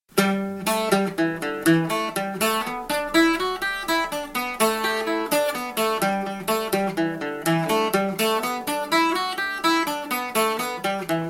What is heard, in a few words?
flying wheelchair, banjo